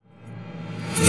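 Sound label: reverse Bash, Cinematic Metal Impacts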